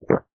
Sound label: drink; sorcery